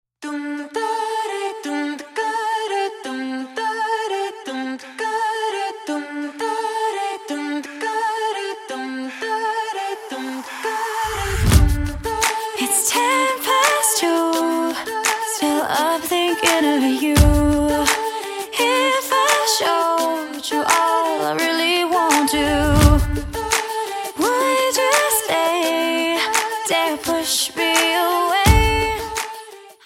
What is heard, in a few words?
water ringtone